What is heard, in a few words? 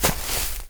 land grass, FootStep; WarRock